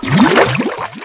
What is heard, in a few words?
Fizz Flush; Candy Crush Soda